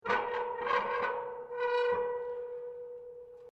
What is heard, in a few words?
METAL CREAK LARGE; Materials